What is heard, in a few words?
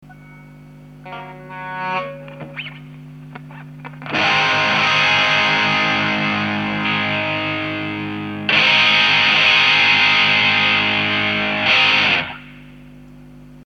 BOSS EH-2 Enhancer, CLEAN DRIVE; MIX：INV FREQ：LO SENS：MAX; OFF→ON